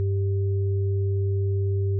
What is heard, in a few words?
Panel Engine Fuel; Among Us